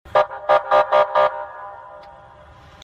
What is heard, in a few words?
beep siren